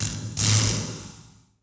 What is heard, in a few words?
Zap Med; electrical sparks